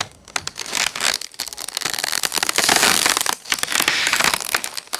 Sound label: wood creaking and splintering under stress